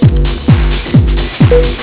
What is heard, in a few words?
tekkno eq